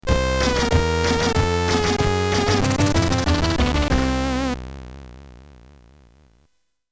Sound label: c64 game over